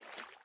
ui pickup water; Rust

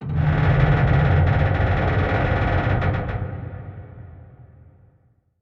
submarines engines; World Of Warships

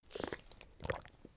tetrapak drink; Escape from Tarkov

